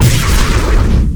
explosion; cow mangler; tf2classic

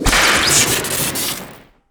whip swing crit; tf2classic

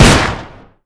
pistol shoot; tf2classic

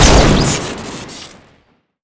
fal shoot crit; tf2classic

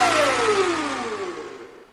flak gun spin down; tf2classic

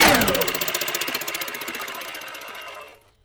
sentry heavy spin down; tf2classic

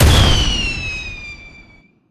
shoot; heater; tf2classic

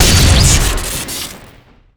widow maker shot crit; tf2classic

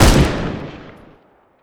Arisaka Shoot; tf2classic

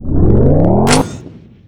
railgun charge, weapons; tf2classic

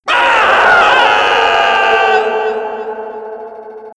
Gladiator Scream; tf2classic

